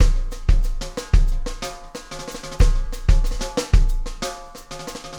Acoustic Drumloop

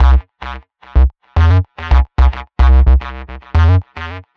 Synth Bass

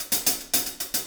Acoustic Drumloop

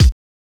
Kick, Drums & Percussion One Shots Drums

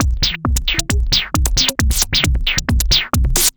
Dance Drumloop

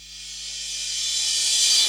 Reverse Cymbal; Drums & Percussion; One Shots Drums

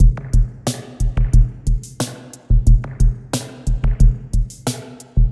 Electronica Drumloop

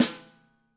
Snare; Drums & Percussion; One Shots Drums

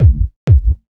Dance Drumloop

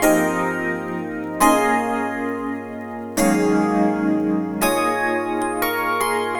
E. Piano

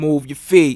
Dry Vocal

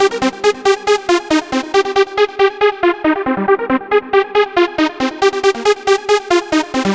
Synth

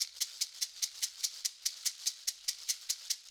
Maraca, acoustic percussion loop; Drums & Percussion; Percussion Loops

